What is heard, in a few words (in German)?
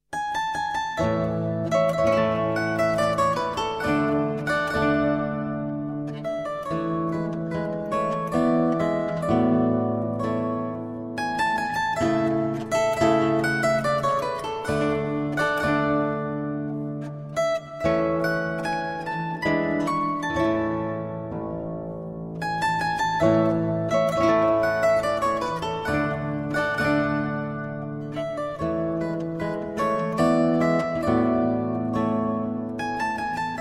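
Bauernmenuett aus Niederösterreich, zither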